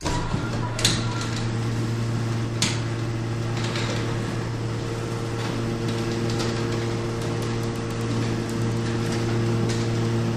Garage Door Wooden

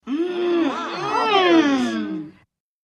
Group 12 Yr Old Walla

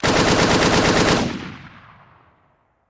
Machine Gun Single Rapid Burst Fire

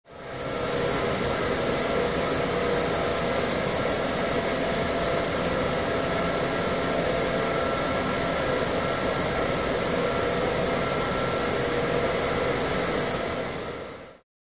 Loud Machine Hum High, machine drone

